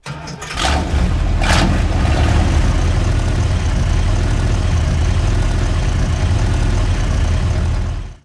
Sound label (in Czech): auto start; Mafie 1